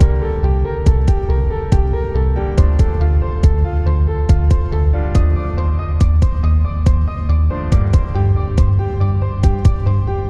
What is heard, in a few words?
Night Rains (piano2) (loop)